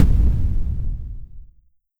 explosion; NIIIEMAND's explosion sfx

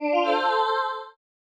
Retro Success Melody choir soprano; Music

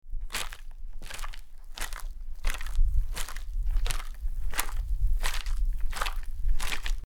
Mud Footsteps; horror; horror sfx vol 2

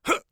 lightattack, Warrior Voice; Warrior Voice Pack

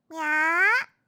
meow, Catgirl; Catgirl Fighting SFX

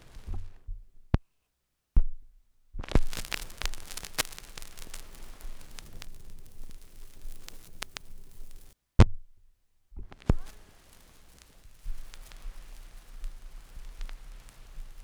Dirty Intro, Record Fuzzies; Micro Pack

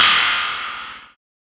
Buzz Step; FREE SFX PACK VOL 2

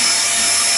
machine; 30 cc0 sfx loops

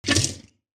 creature slime; RPG

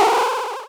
teleport

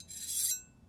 Small Knife - Scrape, kitchen knives; Micro Pack

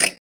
bug; creature

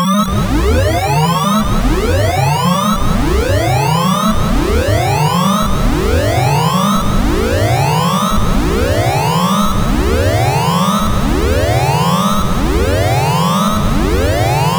Retro Alarm Long; Alarms Blip Beeps; GameSFX

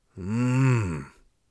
tired, Warrior Voice; Warrior Voice Pack

